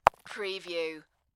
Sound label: Rock Fall And Tumble Onto Rocks